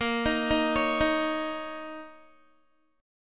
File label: A sharp Minor Double Flat 5th, down, Guitar; 4F,4A,5C,5G,5C,6E; arpeggiated